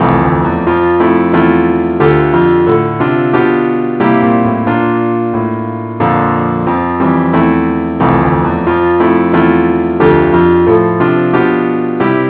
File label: keyboard only key f